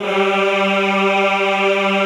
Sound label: EHH G2, Classical Choir; Best Service